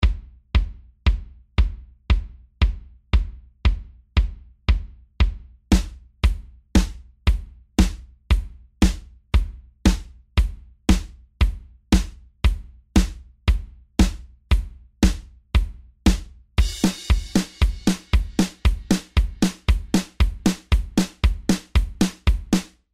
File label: drum